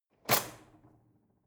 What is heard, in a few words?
drawer cutlery close draw short